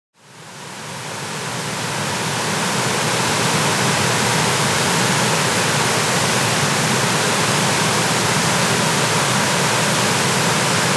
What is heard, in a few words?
White waterfall noise ratanakiri province; CAMBODIA; Les Cartes Postales Sonores; NOISE ON EARTH Vol1